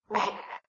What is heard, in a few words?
Gag; Lewd SFX